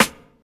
Original Snare Sample C# Key, Royality free steel snare drum tuned to the C# note